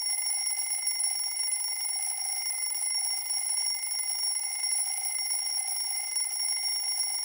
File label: Clock Alarm Mid Loop